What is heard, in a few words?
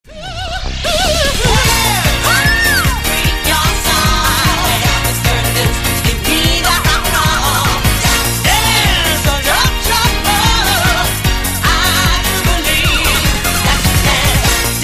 BPM Dance